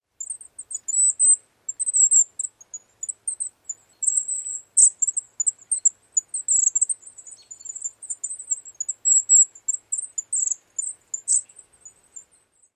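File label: Golden-crowned Kinglet various calls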